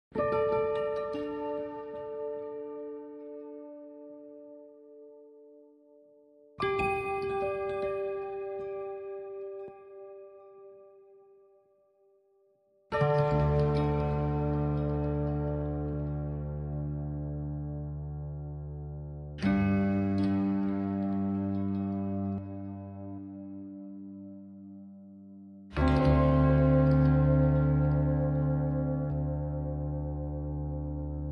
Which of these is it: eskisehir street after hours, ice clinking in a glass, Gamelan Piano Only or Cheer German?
Gamelan Piano Only